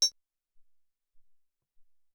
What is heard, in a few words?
Metal